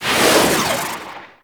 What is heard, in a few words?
Pod Shotgun Proj By